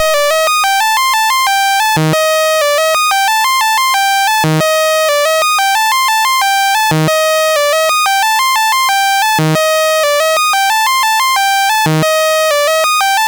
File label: BASIC sid MOS6581R2; hvsc